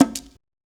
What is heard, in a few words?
Congas; African Contemporary; Spectrasonics; Supreme Beats